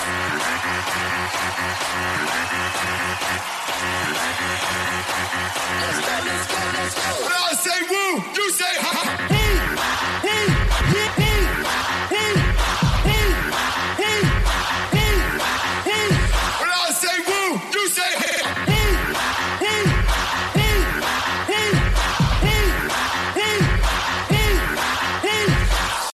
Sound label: new mix ringtone; iphone se